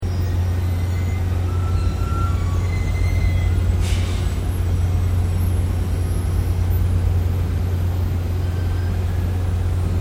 Industrial Machinery Unknown Ambience Squealing; Various industrial machines

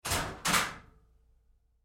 Door Locker Open Roomy Lite, Metal Door; Doors

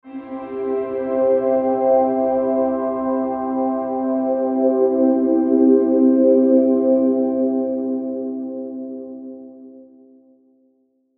Logo Wah Delay; Sonic Logo Sound Effects; Production Element Imaging Element Accent Transition

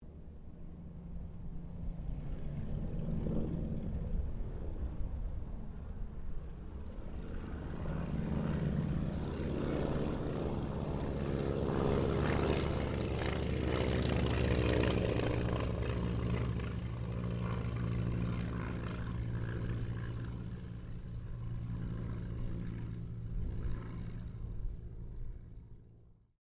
Ryan Auster pass distant, Airplane; Mixed aircraft squadrons

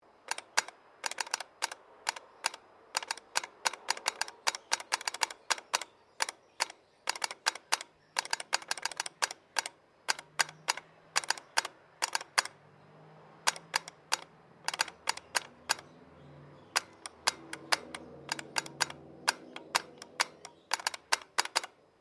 MORSE CODE TAPER SEQUENCE; Morse code aplphabete; Morse Code (Sound Effects:Electronics)

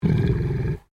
Animal Pig Landrace Growl; Edgar; Edgar's Mission Farm Victoria; Pigs; Animals

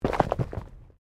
Body movement Impact Roll Gravel; Body movement foley to simulate falls and deaths; Rolling; Objects; SoundEffects-Foundation1

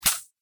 Robot Swat It; sci-fi; Robot Sound Effects; Sci-Fi Robot Sfx; Andrew Fleming

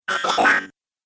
Robot Talk Droid

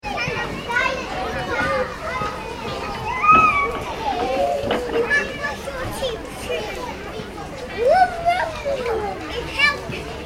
ambience park playground children; Children Play In Playground ( England ); Outdoors; Children’S Playground Ambience; McKinney Sound

